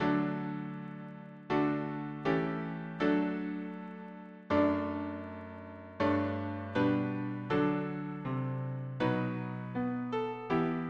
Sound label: harmony piano